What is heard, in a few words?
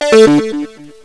8-Bit Outer Limits Beep